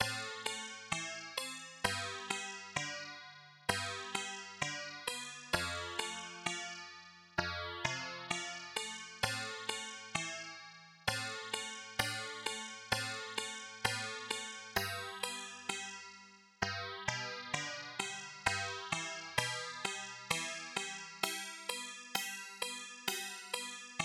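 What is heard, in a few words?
harpsichord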